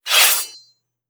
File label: warrior caution draw sword